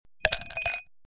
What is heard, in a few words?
Ice Clinks in Glass Without Liquid